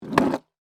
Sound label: Skateboard Up, Sports, Skateboard, Pick, Up, Wood, Surface; Skateboarding Sound Effects; BlastWave